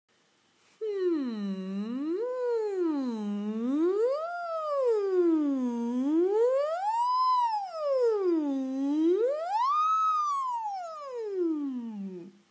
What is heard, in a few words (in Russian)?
Упражнение волна